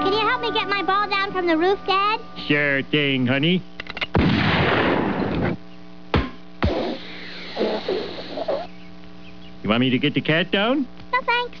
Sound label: Shoots a basketball; Homer